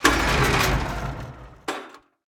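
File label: Weapon Rifle Laser Gatling Spin Down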